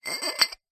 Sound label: household glasses drinking x2 clink together; zapsplat